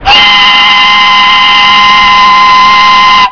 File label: whistle